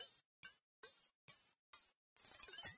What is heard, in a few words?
Jingle; Greendog The Beached Surfer Dude; Genesis